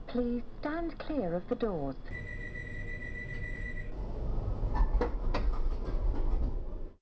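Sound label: Door close Right, Train; LT1972 MkII; OpenBVE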